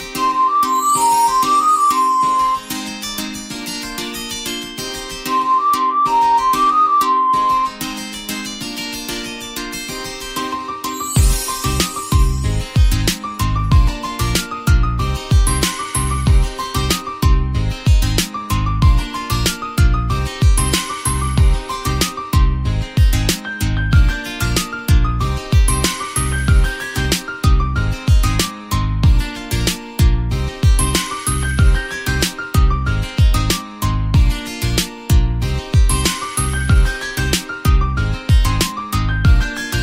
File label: instrumental music ringtone